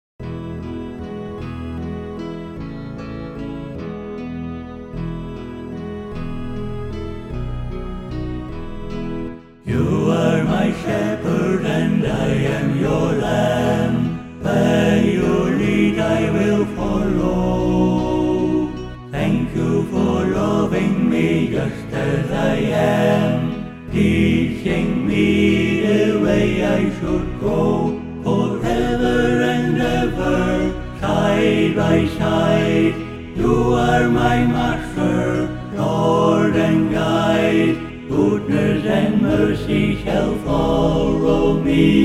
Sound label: SATB; New Hope Music